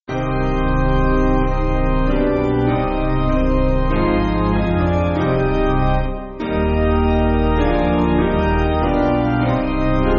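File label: Piano, church backing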